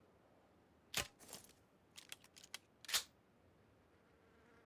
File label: S686 reload with bullet loops